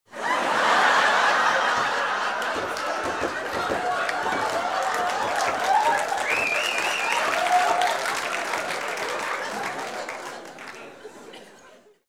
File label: laugh table